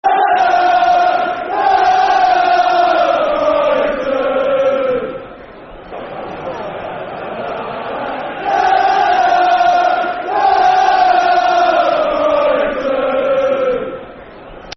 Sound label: Football Chant (Soccer Song), Brighton; La La La Brighton; Brighton Bhafc